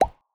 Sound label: crush